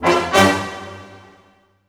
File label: brass accent; Orchestral Loops